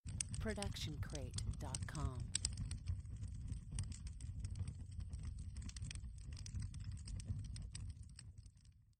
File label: Indoor Fire; SoundsCrate